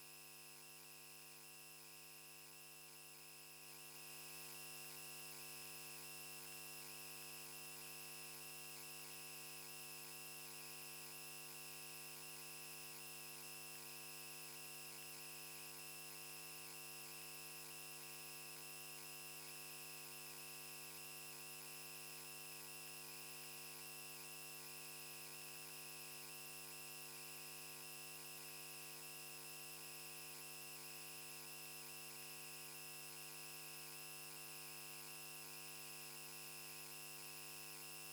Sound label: Electromagnetic Field, Electro; electric fields